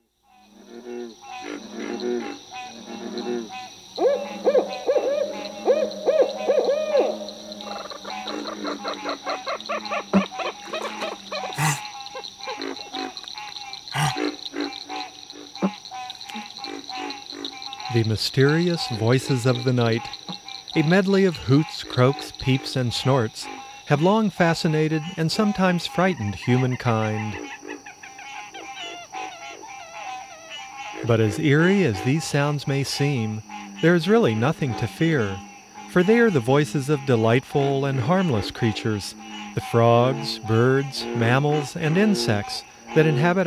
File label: Thrushes; Animals; A Guide to Night Sounds